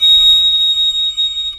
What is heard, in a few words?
piccolo high